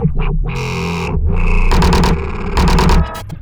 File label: 140 A BASSLINE, Dubstep